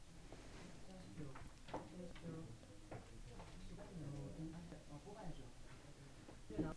beat

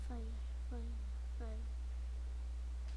fire

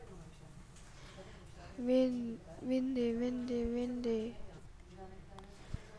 windy

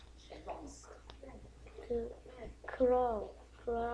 cry

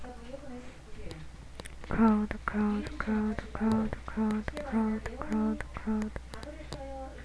crowd

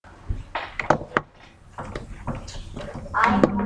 bell

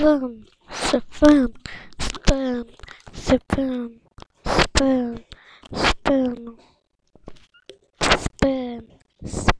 spoon

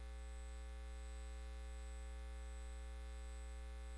car

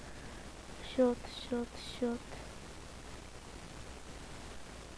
shut

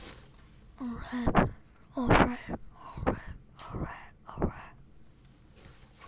laugh

